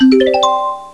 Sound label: biddlidink; Folder Watcher Extension